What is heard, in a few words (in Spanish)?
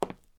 Zapato tacon madera